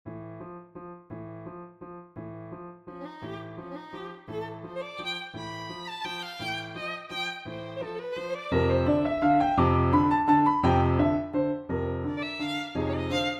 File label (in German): Komischer Walzer Violine Klavier; Besetzung: Violine und Klavier; Tonart: frei